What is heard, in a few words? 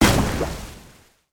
Oil Spray; weapons; aronwk collection; ndaudio